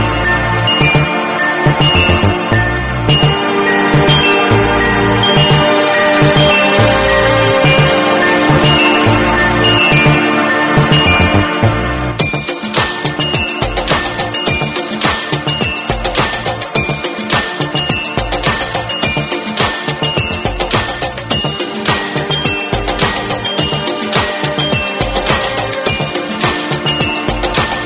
super awesome trending phone tone ringtone; galaxy ringtones

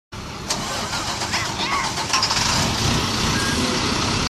bus start ringtone; bus 36

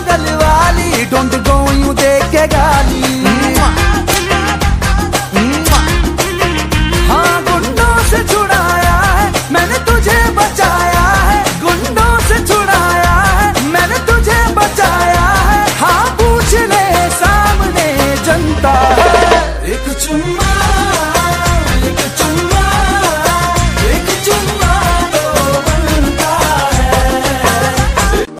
male ringtone, bollywood; bollywood ringtones

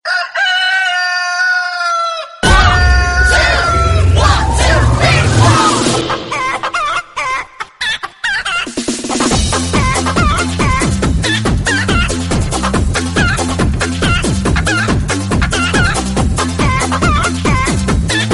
one two three funny chicken sound ringtone

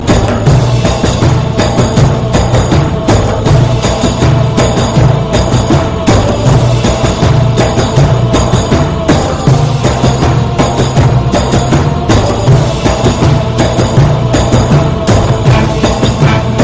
islamic arabic music ringtone

